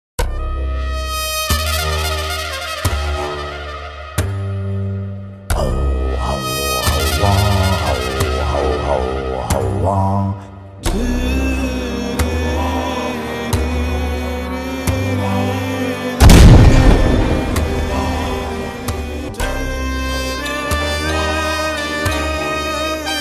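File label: tamil mass bgm; vada chennai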